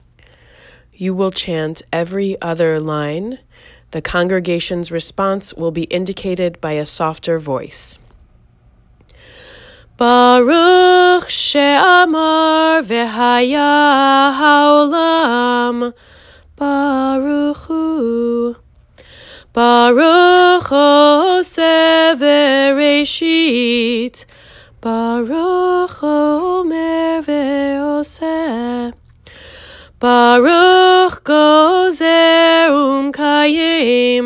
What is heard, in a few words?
Baruch she’amar